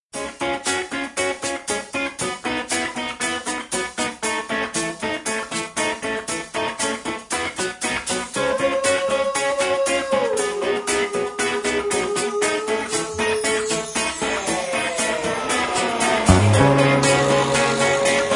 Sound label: Metal Cover